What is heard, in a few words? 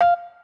menu click; osu!; Skins; Android 4 Kitkat